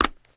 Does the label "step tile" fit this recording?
Yes